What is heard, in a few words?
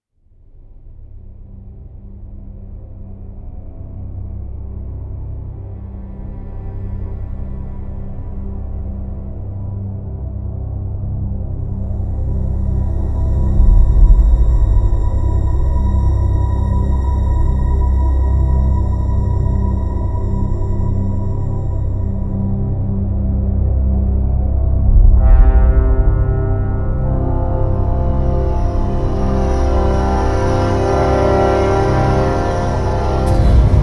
Fight Theme Ambient; crysis 2; ps3; xbox 360